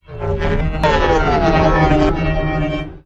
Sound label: Distort Text Glow, stinger